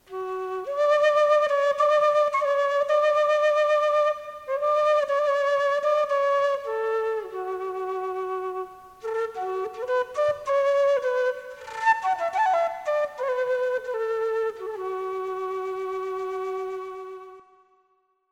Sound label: quenacho